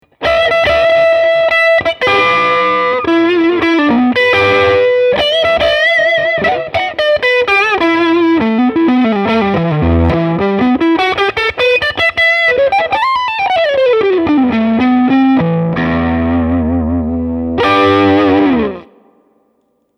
music man 112 rd

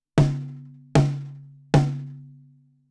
Snare vintage steel; Warm Audio TB12; K3U ME40

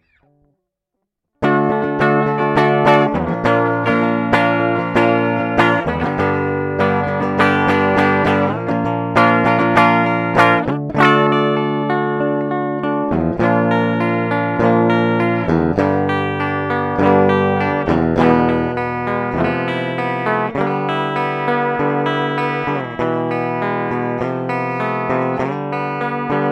Sterling clean

